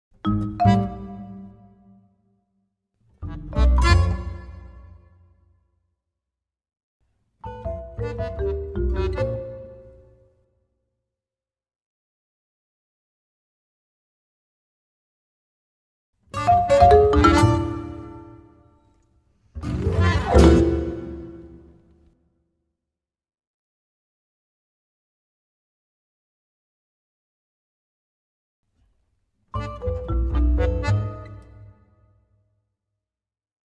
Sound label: acoustic